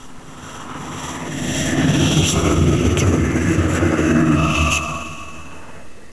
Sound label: teleport